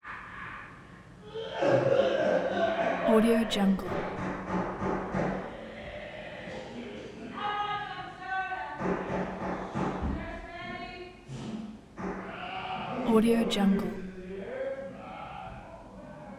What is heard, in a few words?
disabled walla mixed 12 step group live room; prosoundfx